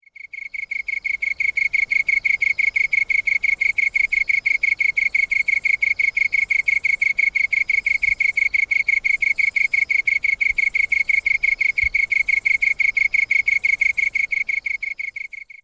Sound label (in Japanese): kantan, singing